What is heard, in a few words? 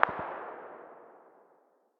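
light machine gun very far distance; tacz-tweak-pack; taczmechanics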